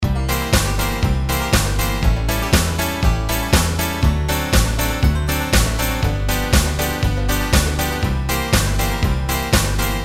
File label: backwoods backbeat boogie, loops